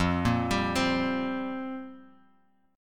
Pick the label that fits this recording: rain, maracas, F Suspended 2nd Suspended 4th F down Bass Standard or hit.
F Suspended 2nd Suspended 4th F down Bass Standard